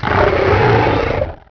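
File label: warrior chirp, bugs; Starship Troopers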